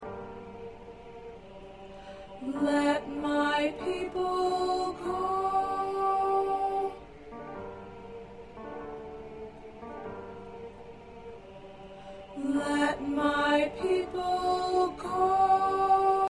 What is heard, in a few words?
Go Down Moses SATB Soprano